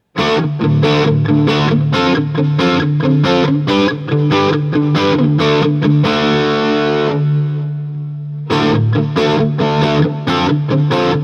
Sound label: Guild S284 EMGs D; Guild S284 Aviator with EMG pickups; Backline